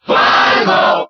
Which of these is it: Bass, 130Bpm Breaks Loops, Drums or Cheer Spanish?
Cheer Spanish